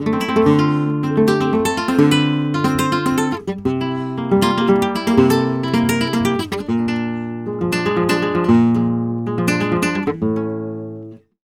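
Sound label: guitar D minor, Flamenco Guitar Arpeggio; Best Service ProSamples vol.46 - Flamenco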